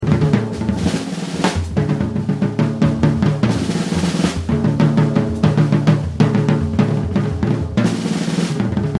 Noticed Drums

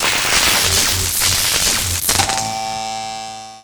Fuse Electrics

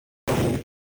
slime explode

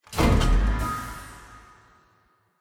claw turn on; RejectRoom

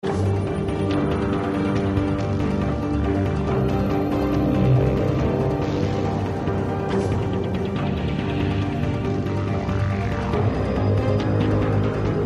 Loop Tense

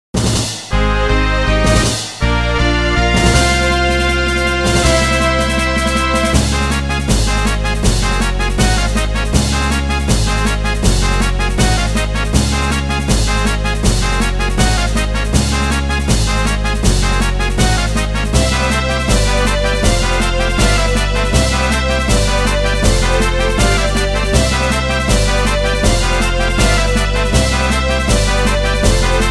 win treasurebox; debt brave and to pay off android game

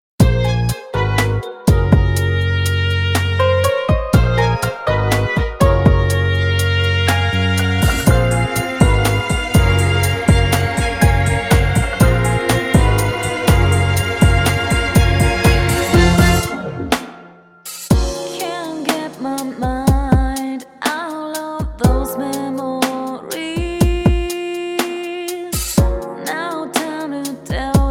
Battle

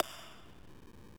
Button Up

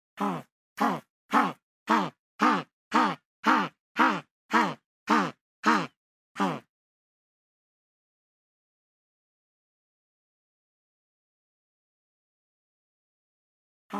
Penguin Walk

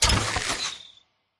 City Turret; the great ottomans strategy battle for throne android

